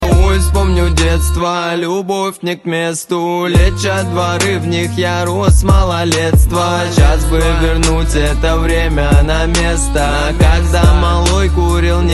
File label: BLATNOI RAP